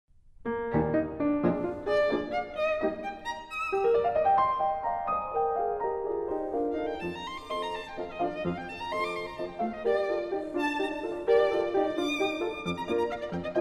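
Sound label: violin sonata; saint-saens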